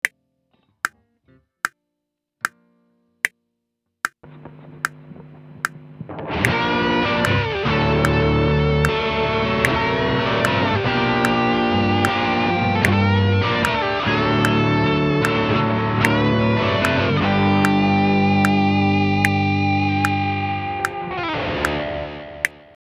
bending lenti, guitar

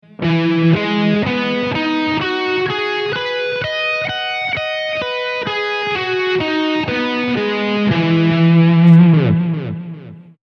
Mi maj9, guitar

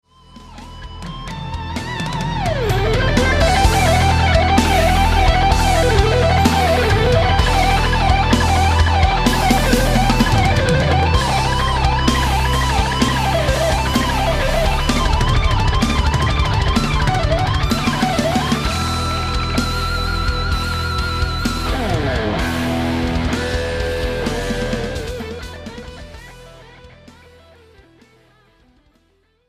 assolo, guitar

